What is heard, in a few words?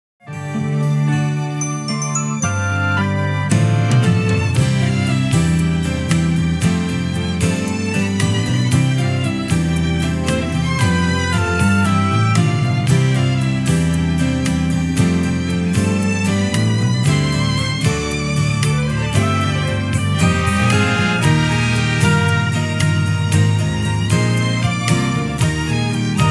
backing track